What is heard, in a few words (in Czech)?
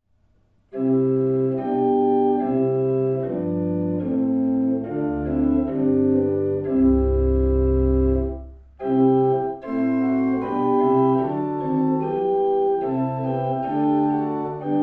ukazky positiv; hudba